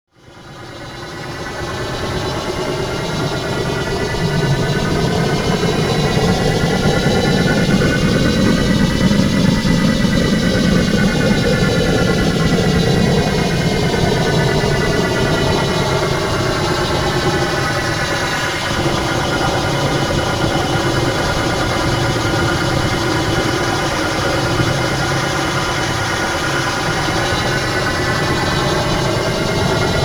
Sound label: Noise Waves; Bizarre Planet